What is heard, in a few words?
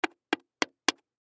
Credit Card Tap Multiple Counter Top; Tapping; Foley; Blastwave